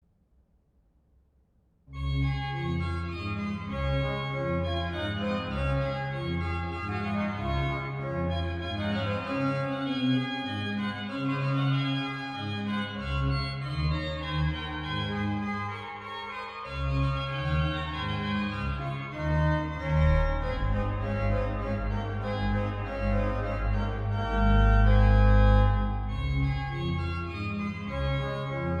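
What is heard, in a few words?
Trio, organ; 1735; Silbermann; Freiberg; Kellner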